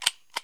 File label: on off, Switch FX; Masterbits